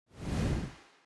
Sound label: short whoosh metal